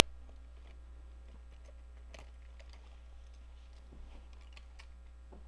violin

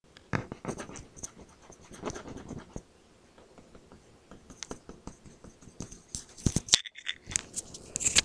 sweep

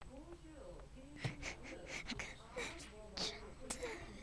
traffic

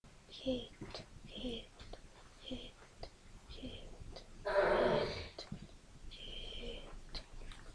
hit

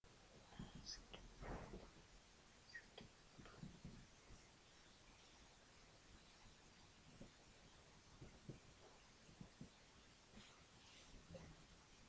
basketball

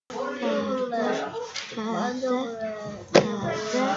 pad

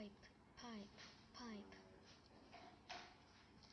pipe

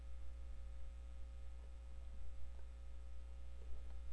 jungle

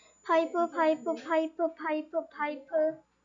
pipe

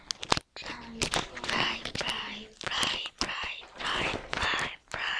fry

